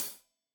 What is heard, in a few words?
Hat Tight, Hi-Hat; Deeply Sampled Drums